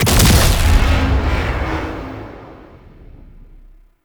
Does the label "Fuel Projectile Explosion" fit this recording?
Yes